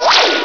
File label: shield on; Robotron; PC Gamer 39